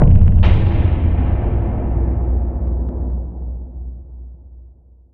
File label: Big Hit